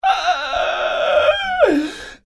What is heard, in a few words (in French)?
cri agonie homme